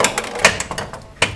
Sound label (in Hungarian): golyo lemegy; Pure Pinball